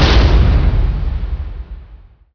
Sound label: stop, doors; quakemapping; mod quoth